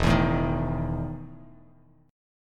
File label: E Major 7th Suspended 4th E down Bass Standard; EM7sus4 strummed